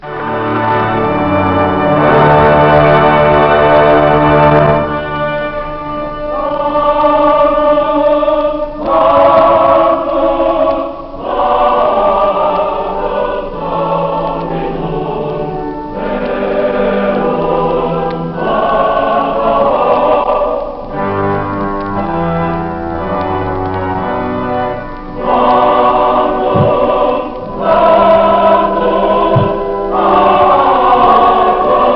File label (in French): les jeux d'anches de l'orgue (trompette, clairon, hautbois...); Sanctus; orgue cath oran